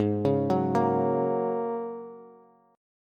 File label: Ab 9th Suspended 4th Ab down Bass; Ab9sus4 arpeggiated; JGuitar